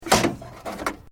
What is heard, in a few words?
Metal Light Movement Minor; Materials